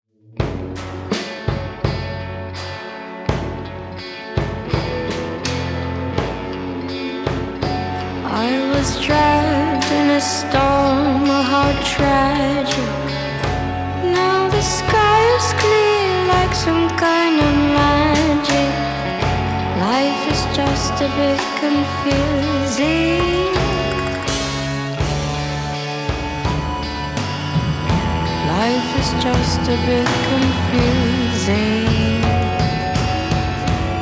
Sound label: Tragic Song